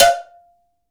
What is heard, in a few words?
cowbell; AKAI S6000 CD-ROM - Volume 5